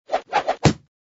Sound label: Move; kickin it; bobby wasabi academy; Jerry